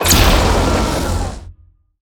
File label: snowplow laser zap